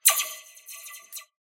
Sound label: scifi weapon blaster accent multiple